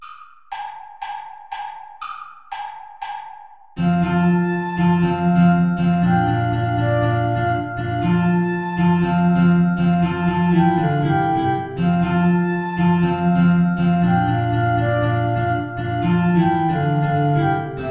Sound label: play along without guitar; traditional